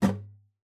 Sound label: GUITAR FX; Xsample VOL-2